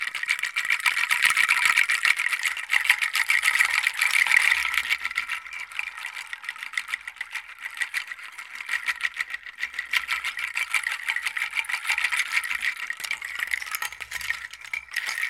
seed shaker studio recorded sound; percussion

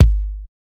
large room bass drum sound g sharp key

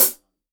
crunchy pedal hi hat sample f sharp key; drum single hits one shots; hat samples

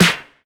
clicky snare single hit f key; Duration 0:01; Samplerate 44100 Hz

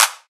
studio clap sound c sharp key

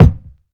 kick drum F key; kick drum samples; single hits one shots

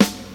00s good steel snare drum sound g sharp key

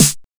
Original Snare One Shot D Key, steel snare drum; snare drum samples

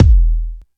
strong bottom end kick drum e key; kick drum samples

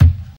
perfect kick sample e key; kick drum samples

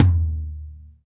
mid tome drum sample d sharp key

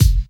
shiny bass drum sound e key; Duration 0:01; Channels Mono; kick drum samples

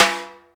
reggae acoustic snare sample g sharp key; snare drum

